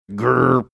making sound driving over grated surface of bridge